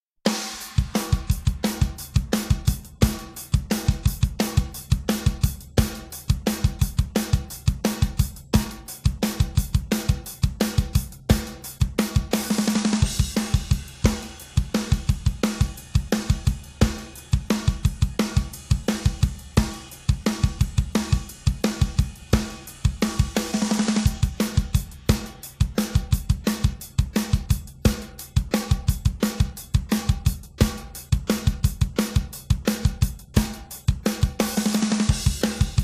drums

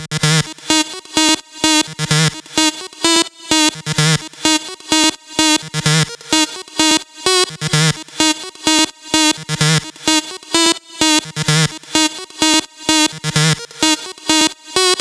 128BPM Lead Loops Sidechain - D#; MELBOURNE DROP VOL. 4

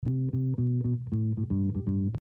Bass

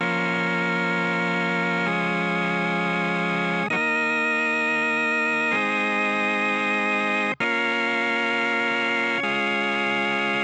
Organ; Synths and Organ

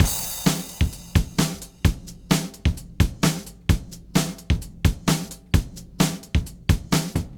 Drums

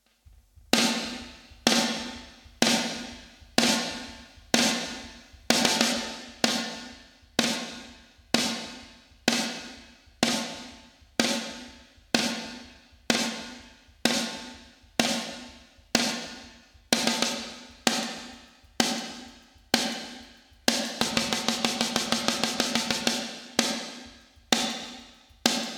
drums

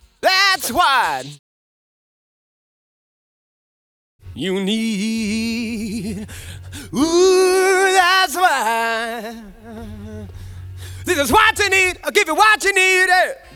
Chorus Vocal; Multitrack; Remix Parts

